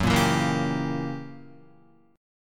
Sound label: Gb 7th Flat 9th Gb Guitar; Gb7b9 strummed